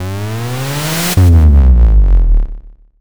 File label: bass drop